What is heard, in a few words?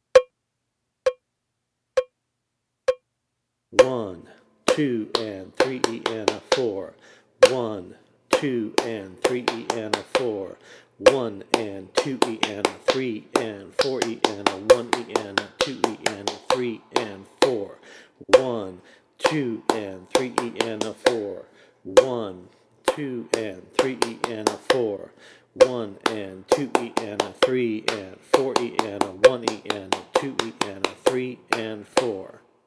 rhythm rap